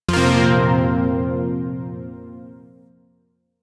Shawzin Blues Chord C; Zariman Shawzin

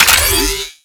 Mod Pickup; UI; Pickups; Warframe

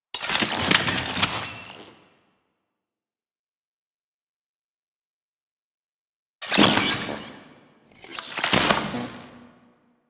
Operator Amp Equip; Warframe; Eidolon Plains

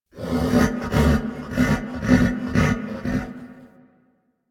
Horse Vocal Mount Neigh; Vocals; Warframe; Vehicles; Duviri

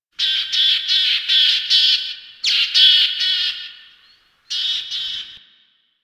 Ambient Bird Calls; Warframe; Duviri

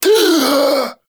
Friendly Revive Complete Gasp; Friendly Grineer; Warframe; Veilbreaker; Kahl

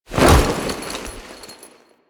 Foley Melee Sweep; Warframe; Kahl

